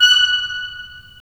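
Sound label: WND CL, wind clarinet; LA Composer vol. 3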